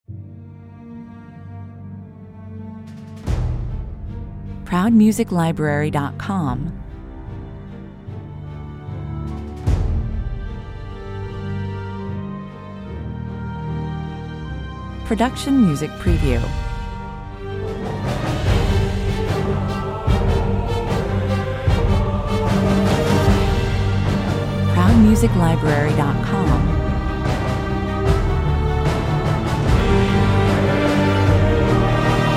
Starting in a pensive and dark mood, this orchestral action trailer cue rises up to a spine-t, 128 bpm